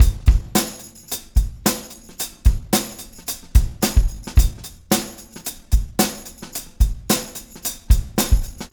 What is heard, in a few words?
Motown, R&B Drums; Legacy; Drummers of Motown